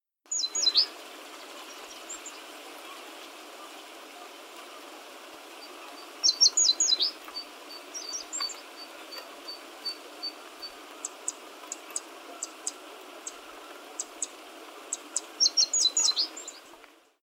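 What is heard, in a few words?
Siberian rubythroat; kozyurin; inasiki; Sano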